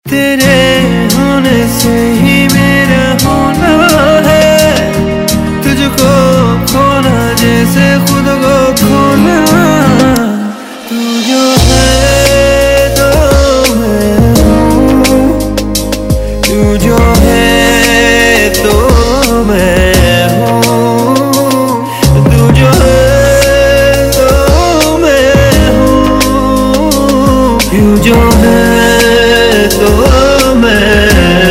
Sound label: Bollywood Medley Ringtone